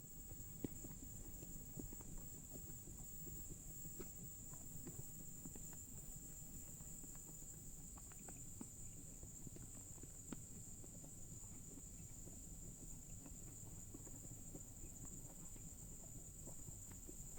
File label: ambient